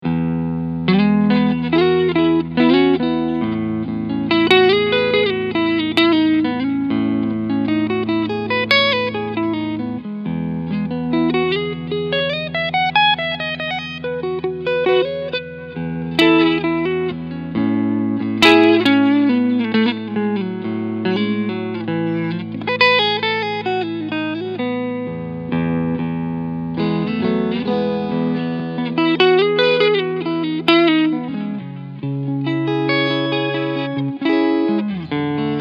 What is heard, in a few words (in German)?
Cleansound Front Pickup; Ibanez ATZ100 SBT